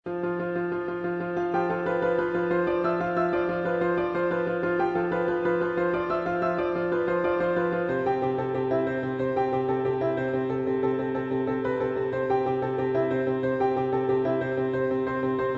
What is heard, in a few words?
Soprano; yeomen; Heres A Man Of Jollity